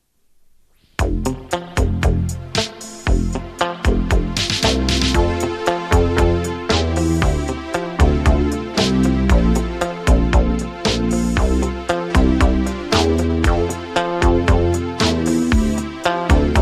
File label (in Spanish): Careta de entrada Radio Verano Instrum